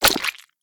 HitByShotgun; SwampJumper

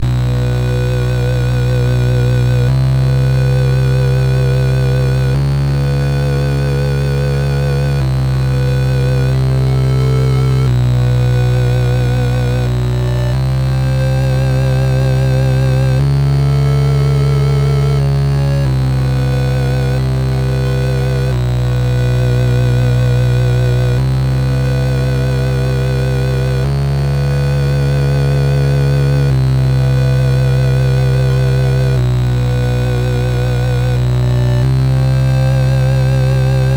Underwater; Esper Dream 2; nes

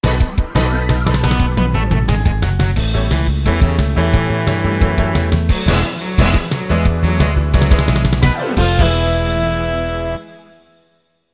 Unstoppable Jingle; hermie hopperhead scrap panic

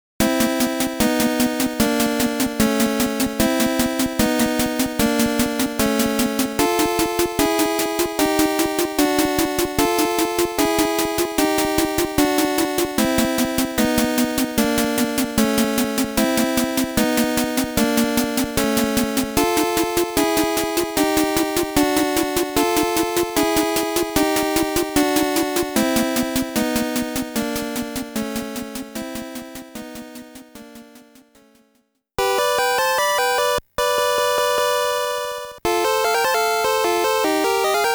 Underground BGM ~ Stage Clear

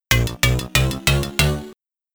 Grassy Plains Jingle; Whizz; Amiga

